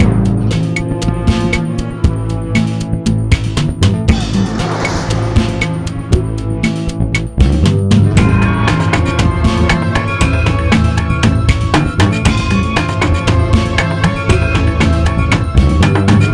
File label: Loading; Gore: Ultimate Soldier